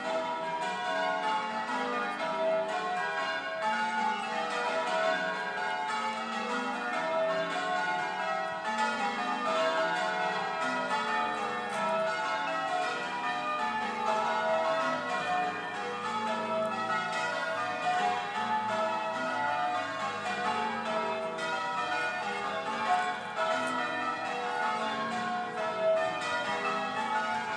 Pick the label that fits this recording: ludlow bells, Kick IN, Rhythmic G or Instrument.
ludlow bells